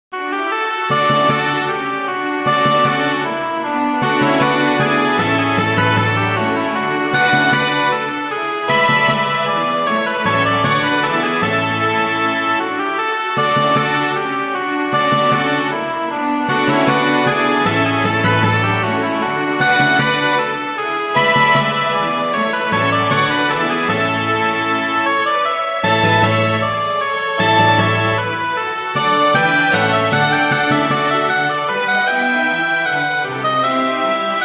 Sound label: Bourree; Bach; Suite 3